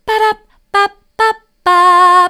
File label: vocal samples; sampleradar